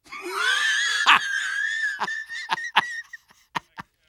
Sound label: Soldier laugh long